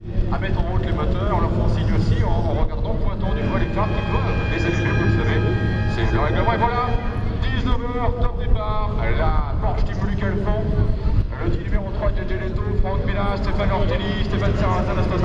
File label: airhorn